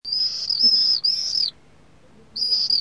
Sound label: duett